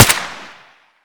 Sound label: famas suppressed fire; weapons